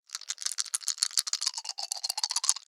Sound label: dice shake; dice, cards, game related; NS Studios - ultra sound collection